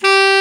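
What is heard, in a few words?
tenor sax hard G; Northstar - New Gold VOL-1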